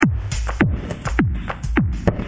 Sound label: tribal, rythm loops